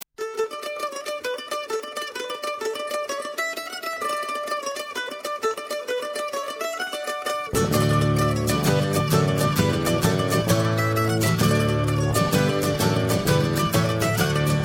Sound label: jig